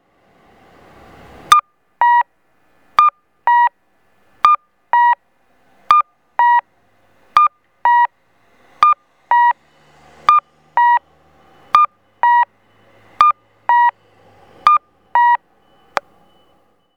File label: koito kakko, traffic signal; MP-I-2; onkyo kosaten; okayama; minami; fukuhamanishimachi